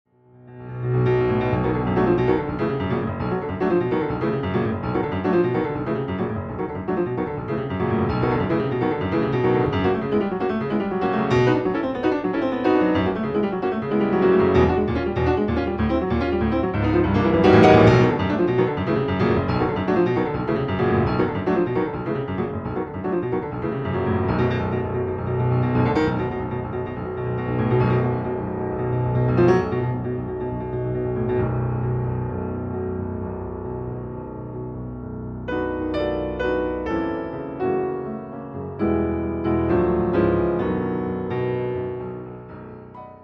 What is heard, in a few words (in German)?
Toccata in H